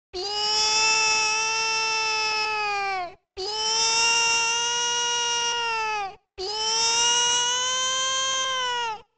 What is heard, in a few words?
waaaaahhhhh baby; goron